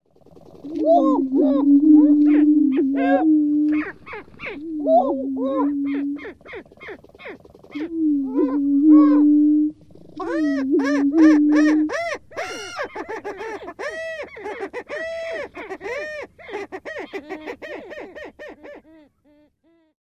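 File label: Greater Prairie-Chicken, lek sounds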